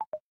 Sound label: power disconnected; BLU G60